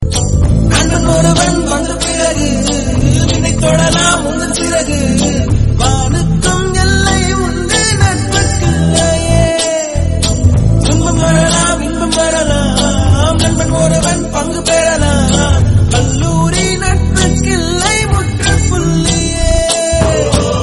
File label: friend song